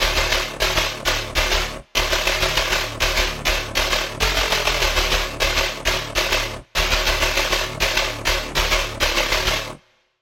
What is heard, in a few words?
Bass